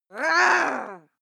female aggressive snarl; schreie; menschen

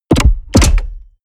mechanics large lock

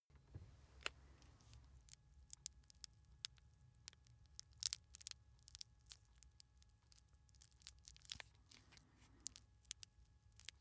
bird eat

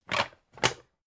pan take hard

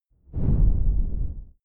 whoosh simple low short grainy; swooshes-swishes

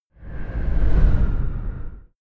sci-fi vehicle train passing fast short; raumschiff

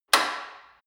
gym bicycle saddle adjust lock hard; sport freizeit; fitnessstudio

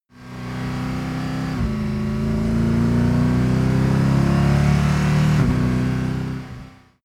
car mercedes sls amg short acceleration

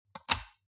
metal device click lock in; industrie-wirtschaft

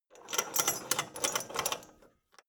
drawbridge winch hoist metal; maschinen; metall; soundpool

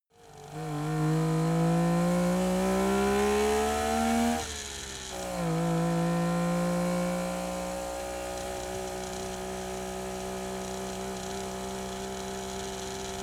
car saab 92 accelerate soft decelerate engine

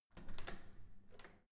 security door open soft

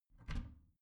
bathroom cabinet warped open soft; objekte; tueren

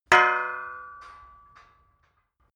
metal impact pole

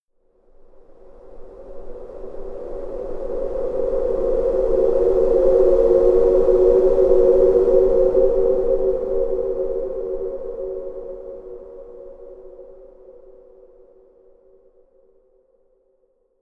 windy, music elements; flaechen

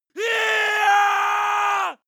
male attack aggressive scream long; schreie; menschen

